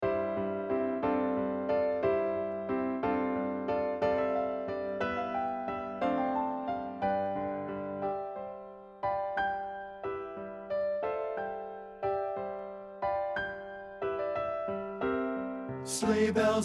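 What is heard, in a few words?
bass, choral; partbypart; satb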